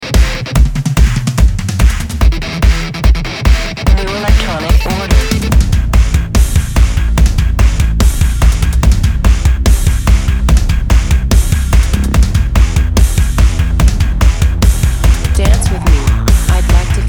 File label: industrial